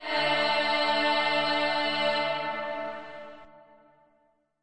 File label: Slavic Choir D Aye